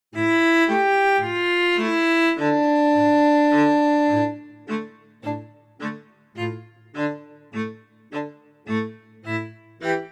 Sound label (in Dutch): Klezmer festival